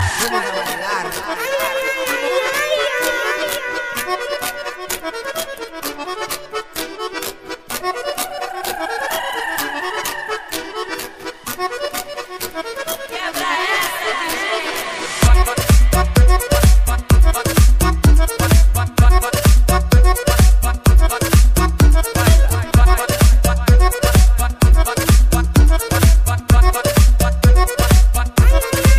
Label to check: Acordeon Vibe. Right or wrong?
Right